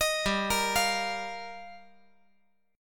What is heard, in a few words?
G Minor 7th Sharp 5th